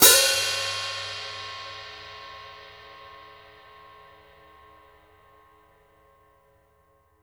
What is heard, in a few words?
open foot, hi-hats; Sampleheads; New York City Drumworks VOL-1